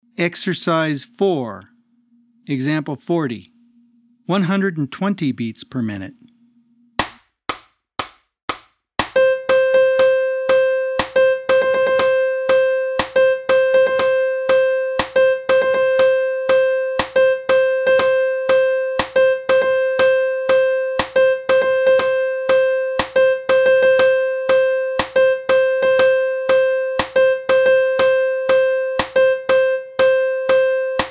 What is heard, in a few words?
120 BPM